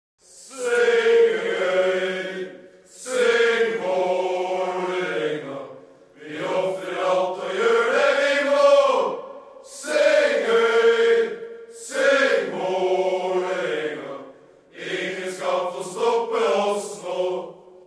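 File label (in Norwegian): Syng høyt syng; Vaalerenga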